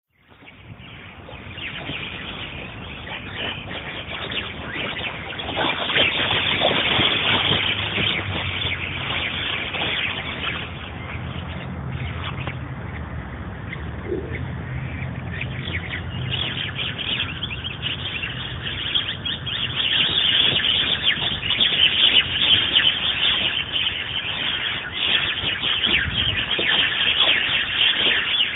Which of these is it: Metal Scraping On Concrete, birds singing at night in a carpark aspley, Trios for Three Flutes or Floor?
birds singing at night in a carpark aspley